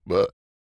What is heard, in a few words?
burp; OPENAIR; Anechoic